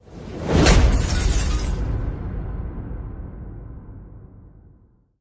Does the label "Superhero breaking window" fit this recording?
Yes